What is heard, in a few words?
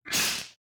snake attack